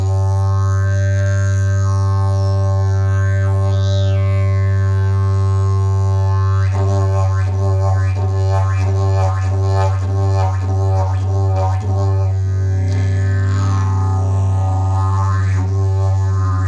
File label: didgeridoo; javor cestovka